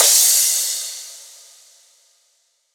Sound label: Crash